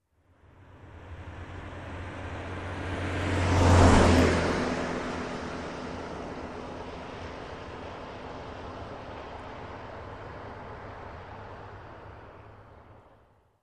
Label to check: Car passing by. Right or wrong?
Right